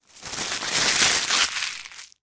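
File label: foley christmas paper hat scrunch up; zapsplat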